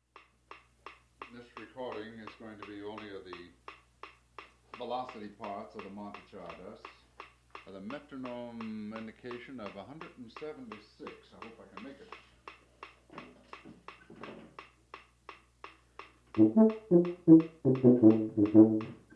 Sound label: Czardas (w/ metronome)